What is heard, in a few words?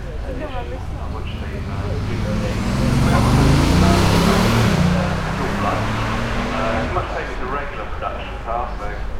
Bentley Continental